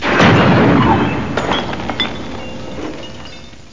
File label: Impact